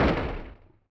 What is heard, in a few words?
INT backfire, Vehicle; NASCAR Thunder 2004